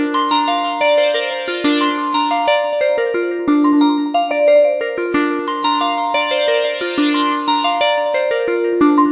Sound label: synth pretty descending arpeggio; SYNTH AND ELECTRONIC